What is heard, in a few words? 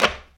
steel land; Golden Crayon Sounds